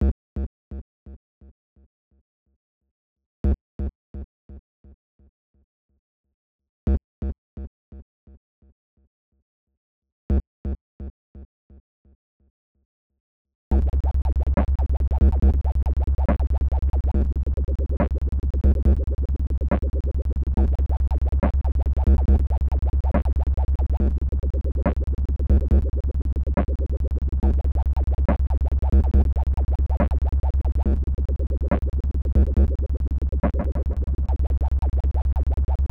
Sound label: grime sine